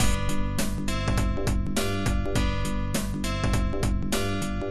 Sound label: theme loop slow rise